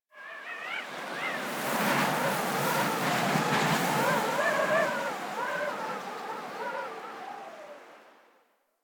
Landscape Wind Gust High, Ambience; Warframe; Venus Open World; One Offs

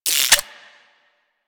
Pistol Reload C; Bard

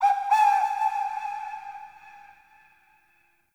Decoy Flute Call; Powers; Fey; Saboteur

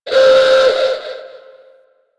Klaxon Alarm; Ambience; Warframe; Grineer Fortress

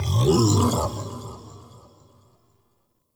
Cannonball Slam Vocal; Powers; Bromius

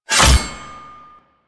Crafting Equip, UI; Warframe; Cetus

